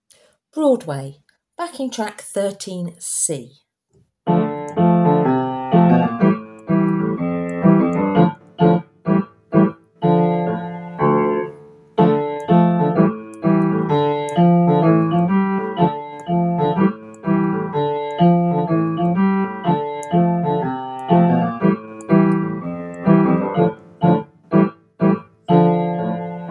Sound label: Broadway backing track